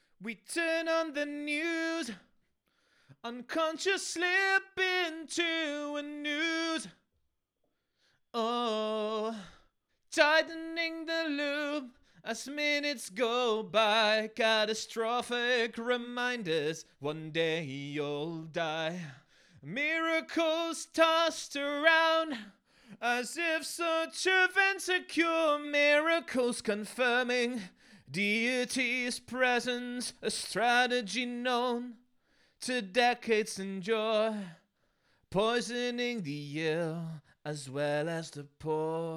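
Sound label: Alt Rock, 100, G major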